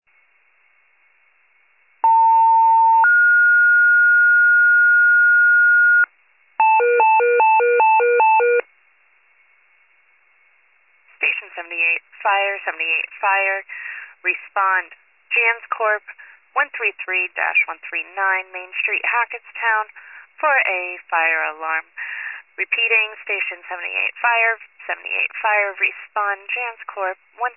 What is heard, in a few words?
FIRE pager alert